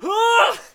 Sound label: Musketeer Attack Vocals